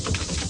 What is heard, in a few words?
Engine; children of morta; Home